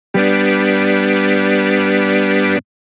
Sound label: Analog Beat Organ; jup8